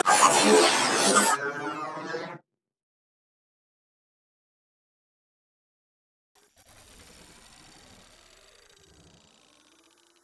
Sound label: kick; tridroidznnpc